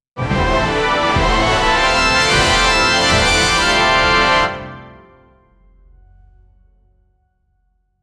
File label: maximum energy jackpot, circus